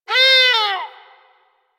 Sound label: Forest Animal Cries; Warframe; Ambience; Grineer Forest